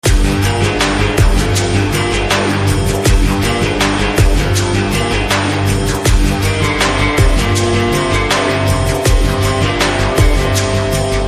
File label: BGM Telugu Ringtone